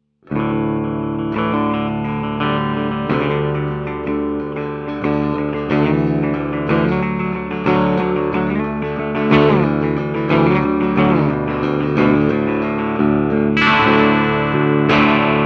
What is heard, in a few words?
Clean